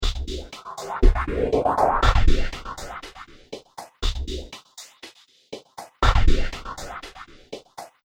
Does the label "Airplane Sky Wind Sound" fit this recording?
No